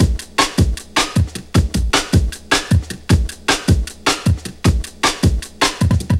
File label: Jazz Thing Break, Loops